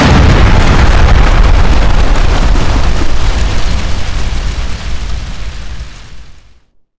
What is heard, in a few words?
building big explod; Explosions